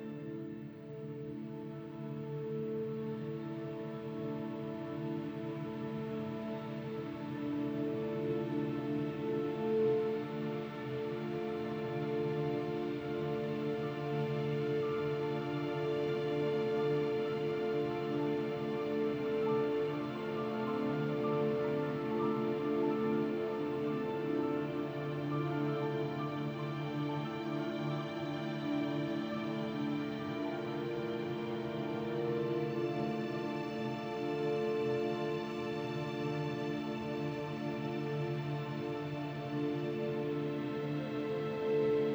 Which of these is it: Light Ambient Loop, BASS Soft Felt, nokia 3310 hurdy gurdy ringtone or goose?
Light Ambient Loop